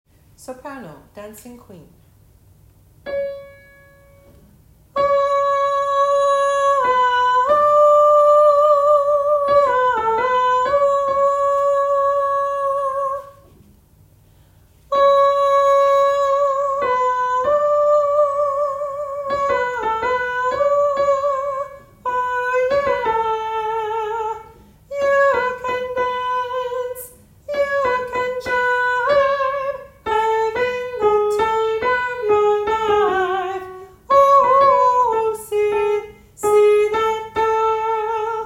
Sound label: sopranos